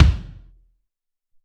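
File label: SR16 Kick 1, Alesis SR-18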